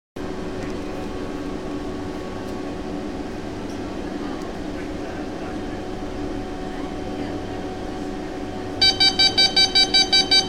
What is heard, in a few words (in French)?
Bip portes